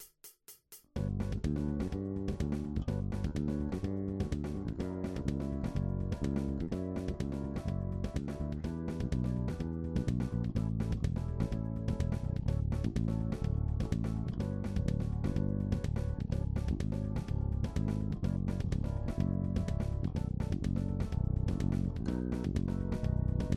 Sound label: finger Active; Kensmith